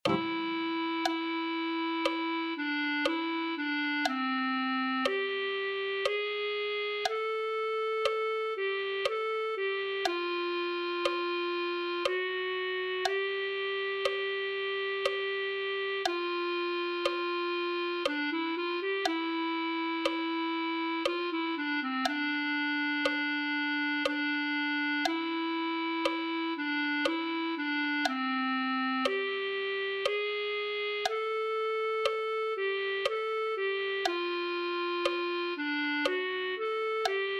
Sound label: clarinet